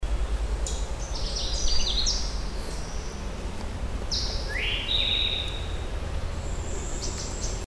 Canada Warbler; Garrett MD

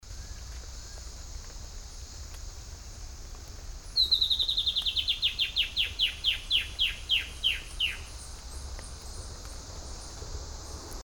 White-bellied Antbird, Panama; Gamboa Panama

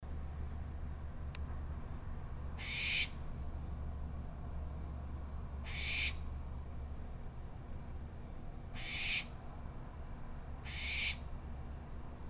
Barn Owl; Kern CA

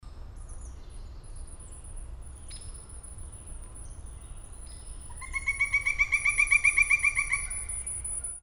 Pileated Woodpecker; Tuckahoe CN MD

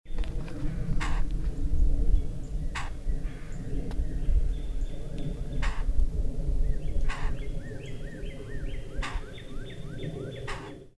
Brown Thrasher

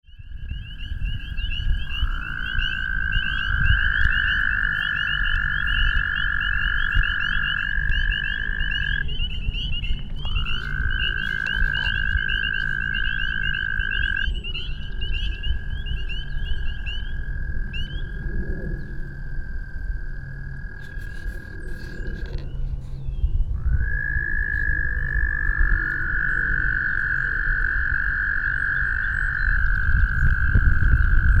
American Toad; Baltimore MD

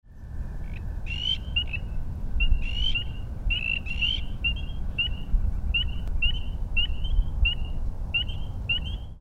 Spring Peepers; Baltimore MD

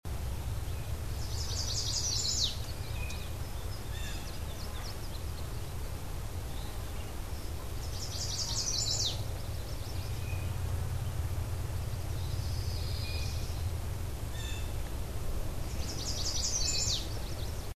Chestnut-sided Warbler; Garrett

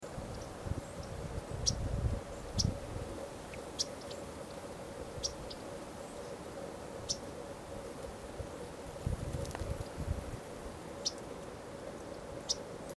Audubons Warbler, MD

